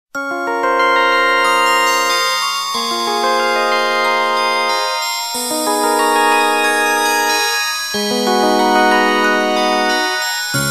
Hushed, Nokia Tones; Windows Phone apps

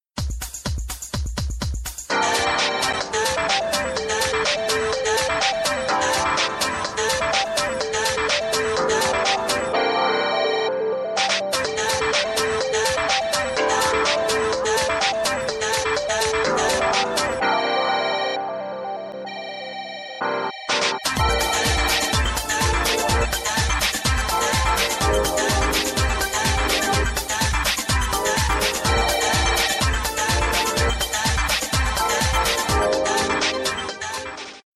Calling Trip; LG P520 Terry; Ring

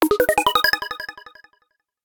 Fast, notifications; China Mobile; A3s

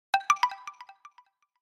Message, notifications

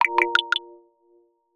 Nokia Message; X100

